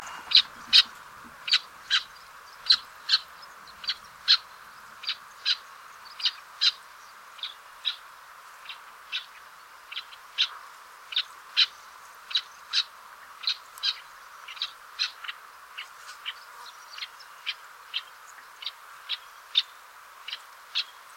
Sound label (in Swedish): klipphona; Tero Linjama